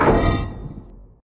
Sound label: hit; DanisRace